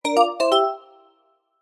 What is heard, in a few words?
game Win